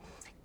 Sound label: Birds